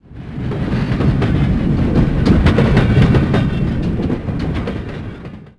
train pass, Ambience; urbanchaos

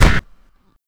ballistic; Notrium

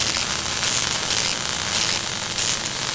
torch; Messiah; WEAPON

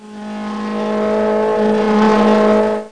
BUZZ; cdromtoday9

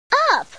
toy up; KidPak

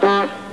Honk; Keep the Bozos Out

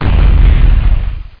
PANEL; Caesar3

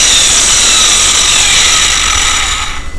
Saw Stopping; After Dark 2.0 Modules

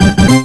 Extra Ship; Cyclone II

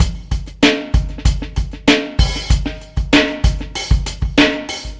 Packet, downtempo beats

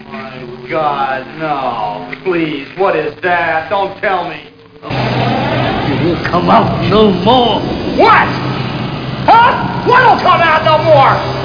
empty recycle bin

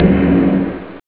dzing; OctaMED SoundStudio V1

